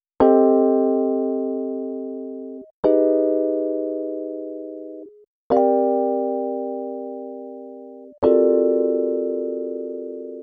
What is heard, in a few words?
Rhodes, Cinematic Funk; Sonic Foundry (Sony Creative Software); Urban Tone Poems